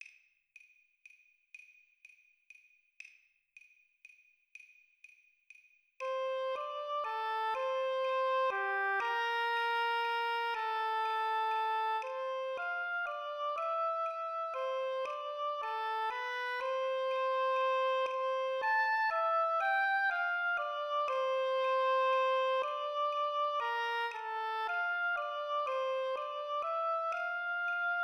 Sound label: Oboe Play Along 40 BPM